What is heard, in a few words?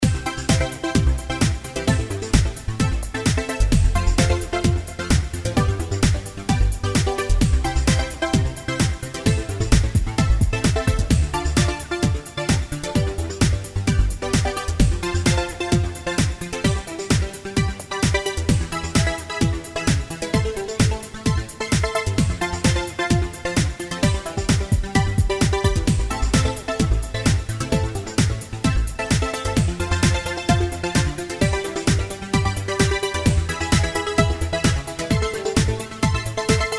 Blissful Trance